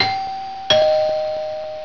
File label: Bull sound